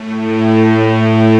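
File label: full strings A2; FULLSTRGS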